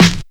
natural snare c sharp key

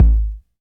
subtle reverb bass drum single hit a key; kick

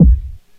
perfect kick drum b key

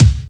dry kick drum single shot A key; kick drum samples

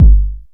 classic bass drum single shot g key

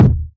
nice kick drum sound D sharp key; kick drum samples

